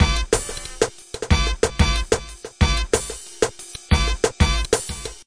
pressbronx fill, drum loops and breaks; 181 bpm plus

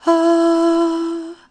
Aah E, Female Breathy Vox; VOCAL

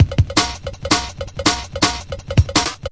165 bull bell, drum loop; 161 to 180 bpm

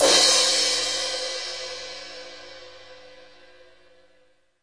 Crash

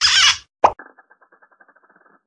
cork pull and pop; Cartoon FX

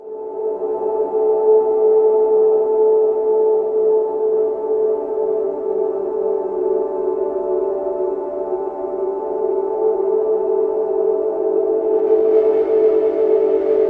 harmonic resonate; Atmospherics

